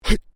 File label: MALE VOCALIZATION HUH; Exclamations; Voice